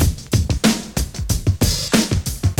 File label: DRM CHOP 93; AMG Gota Yashiki Groove Activator